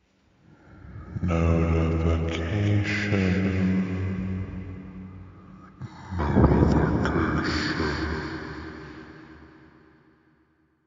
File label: Creepy notification